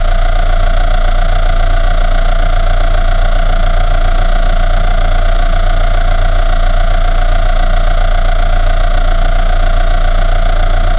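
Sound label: car gearbox sound loopable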